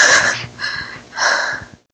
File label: Pant